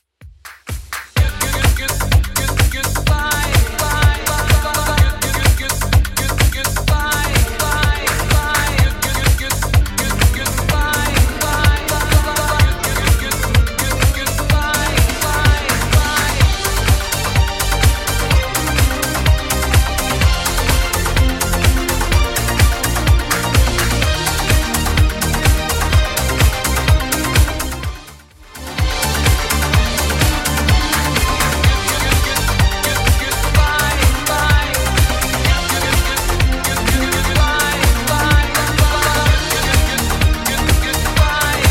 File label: 80s Redrum 126bpm